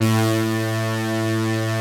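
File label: synth horn A2, Prophet 5; Greytsounds VOL-1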